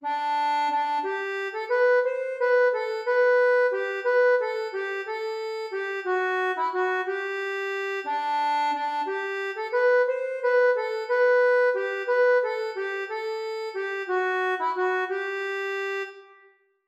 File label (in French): ACCORDEON